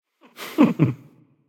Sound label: hehheh; smite; nezha